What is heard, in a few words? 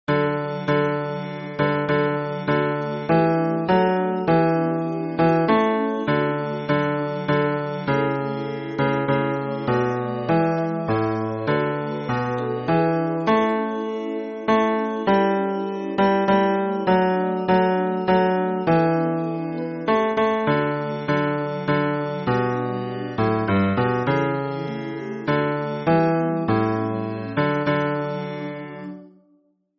hymn bass